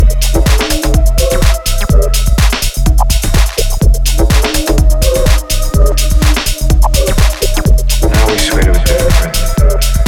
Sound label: Industrial Rhythm